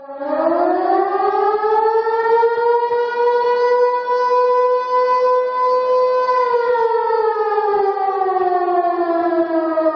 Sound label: nuclear alarm siren